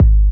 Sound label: Kick, Trap Foundations Kicks